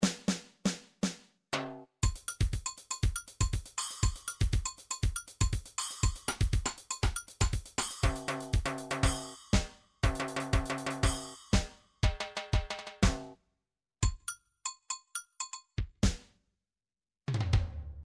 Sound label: drums samba, midi drum patterns